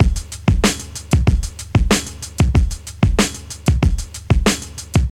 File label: 94 bpm drum loop c key